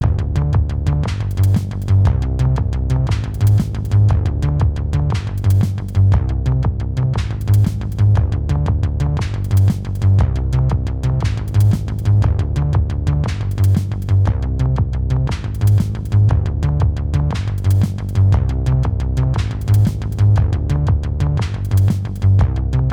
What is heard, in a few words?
classic bass classic analog